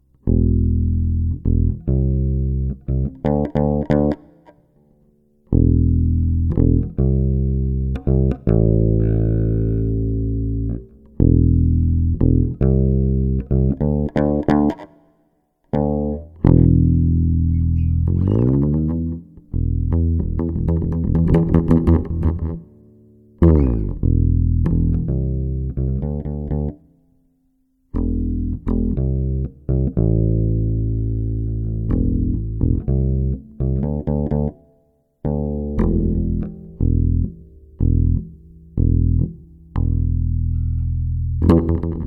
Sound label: Bass; MegaEpix